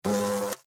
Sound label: Hornet, By, Land, Fly, Wings, Buzz, Insect, Bug; Sound Effects:Animals:Insects; Blastwave